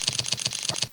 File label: The crunch